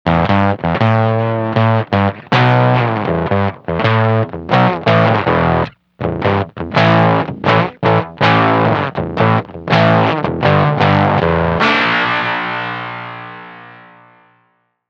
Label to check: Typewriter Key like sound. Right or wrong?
Wrong